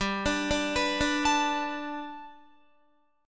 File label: G Major Add 9th, Guitar; Gadd9; arpeggiated